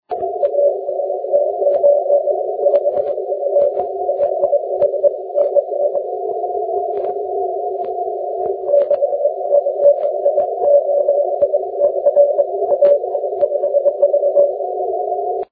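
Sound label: 30m continuous wave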